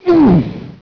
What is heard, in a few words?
aargh